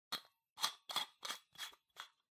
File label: Pendant Light Bulb Insert